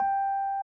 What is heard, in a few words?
Synt; SYNTH; 80 BPM